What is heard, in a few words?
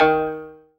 zimbal D#2; ZIMBALON